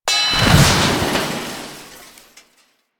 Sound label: Fire Extinguisher Destroy; Warframe; Ambience; Nineteen Ninety Nine